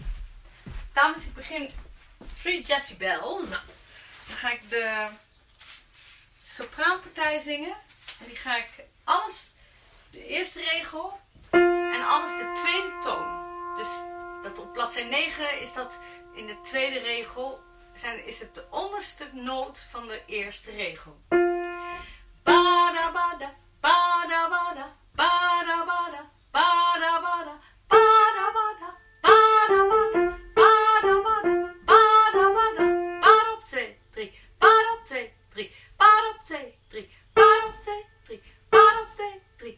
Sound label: Tenor